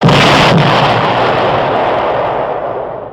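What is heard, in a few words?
explosion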